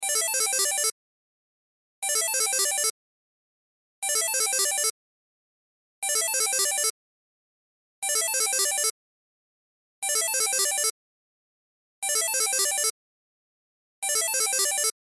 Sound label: Cell Ringtone; Loop; Cell Phones; Electronics